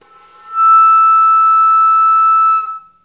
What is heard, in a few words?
F6, baroque flute